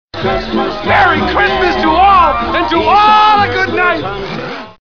christmas alert; ringtones

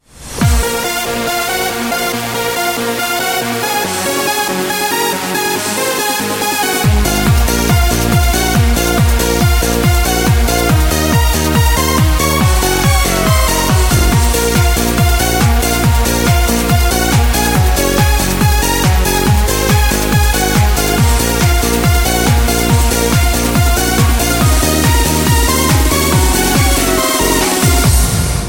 Dance House, ringtones

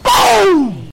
Boom

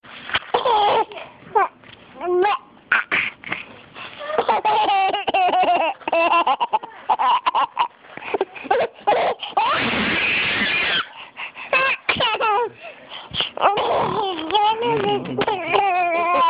giggle; children

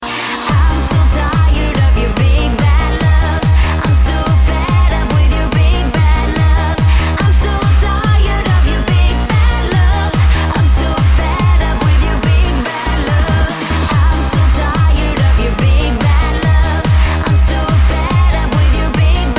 trance; quake

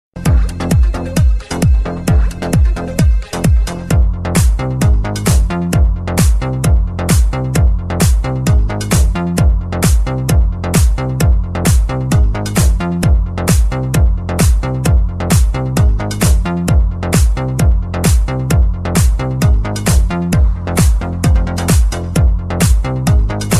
sound of disco

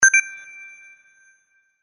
xbox 360 power

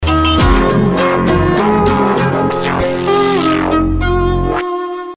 signal; MTV1; szignal

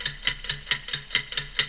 Tick Tock Loopable

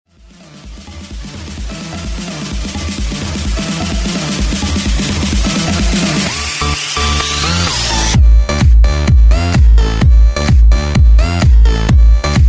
Dance Melody

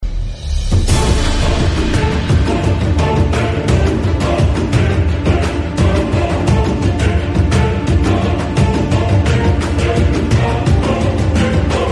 Cinema Trailer

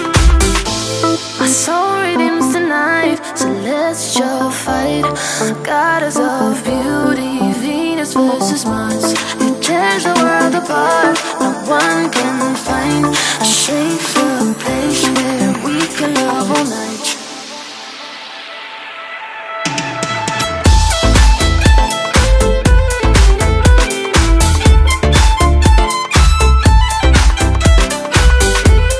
Violin song vocal

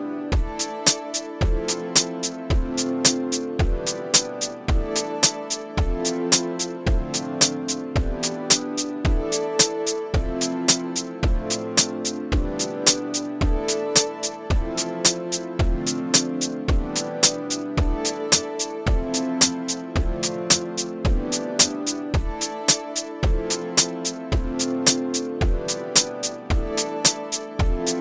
Chill ring tone

